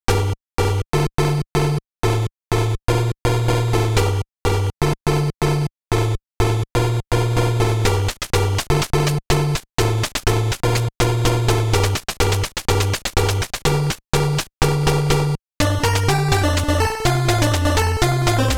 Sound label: rave 8bit